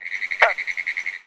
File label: Frog Green Tree Croak